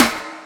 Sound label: SN, Drum Machines